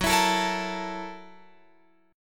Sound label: F sharp 7th Sharp 9th, Guitar, Open F; F#7#9 strummed